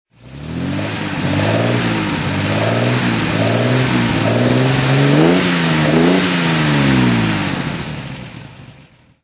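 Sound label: healey 3000mk3 weber throttle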